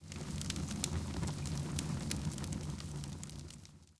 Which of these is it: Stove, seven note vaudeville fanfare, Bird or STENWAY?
Stove